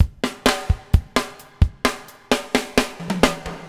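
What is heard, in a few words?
BUBBLE left; Spectrasonics - Backbeat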